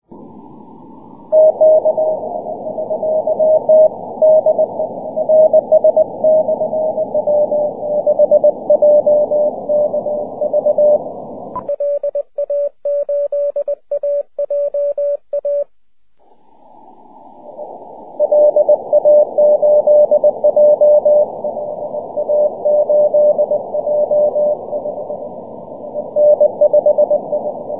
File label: 6m cw